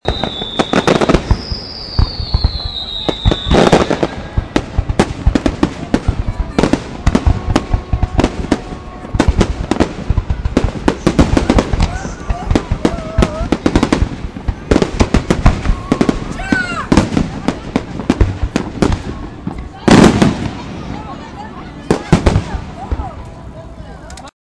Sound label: riverfront fireworks